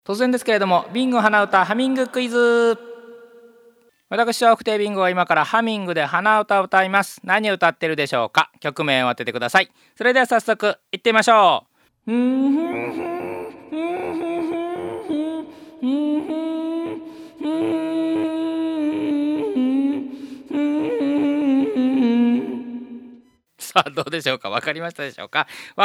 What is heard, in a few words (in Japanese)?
hanauta